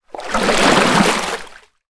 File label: transport boat paddle water single